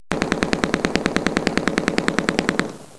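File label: machine gun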